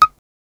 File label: cash register completion